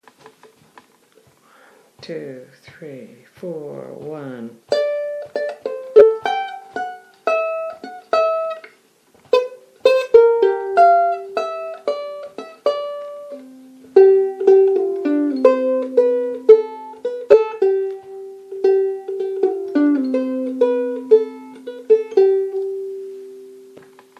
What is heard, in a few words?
riff, ukulele